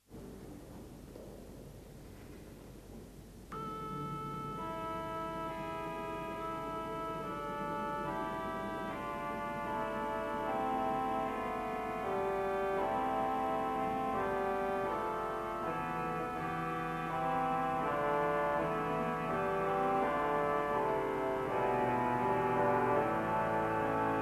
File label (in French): orgue